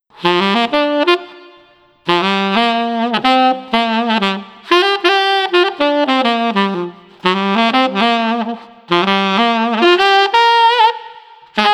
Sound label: Street Sax Exciting 120